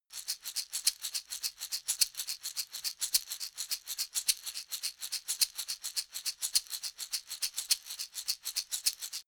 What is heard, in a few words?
shaker wooden slow long